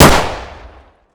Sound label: glock18, weapons; garrysmod